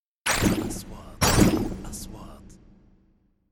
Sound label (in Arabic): نغمة اندفاع مائي سريع; transitions